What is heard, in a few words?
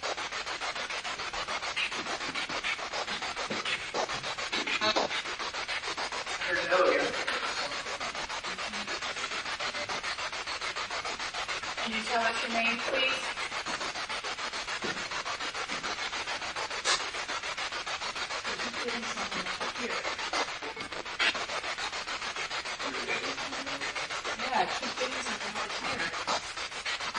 Attic Rec Area Asylum Spirit Box; madison seminary investigation